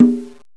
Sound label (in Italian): conga